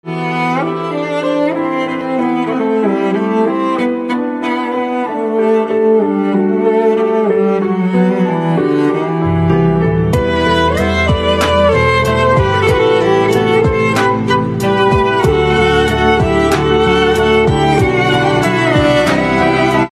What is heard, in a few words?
Violin Instrumental Ringtone